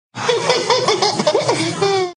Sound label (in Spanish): Risa; De Platanito